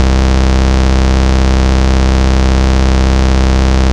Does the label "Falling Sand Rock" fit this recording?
No